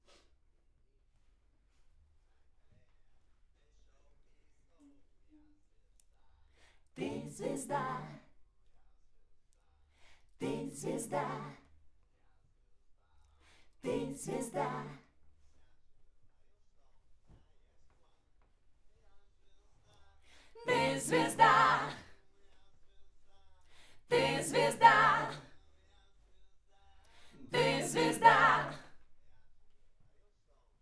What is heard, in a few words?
Backs AKG LEFT